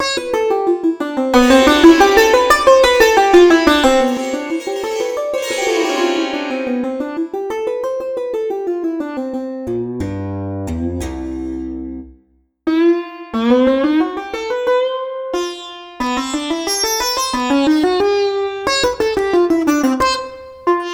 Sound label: sitar subtracks